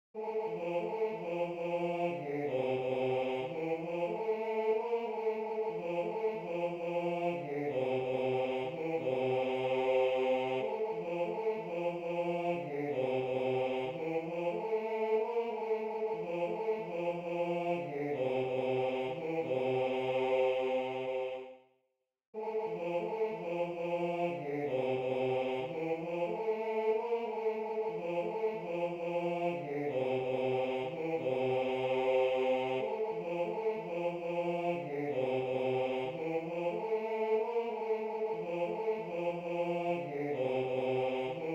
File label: Bass I; Little David Play On Your Harp